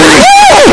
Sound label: augggh